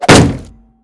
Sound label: shield light bash